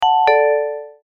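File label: Exit Chime, Bay Area Rapid Transit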